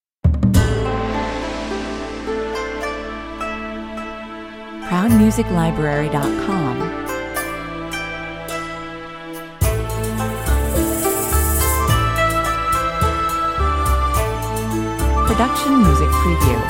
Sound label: thai beauty 106 bpm